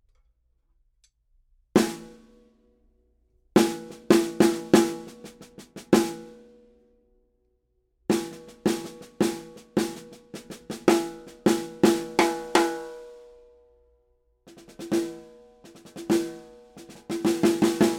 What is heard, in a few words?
Yamaha Snare, 6.5x13; Steve Jordan Signature